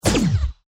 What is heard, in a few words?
Shot; One Shot Fishing